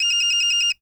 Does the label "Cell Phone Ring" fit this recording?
Yes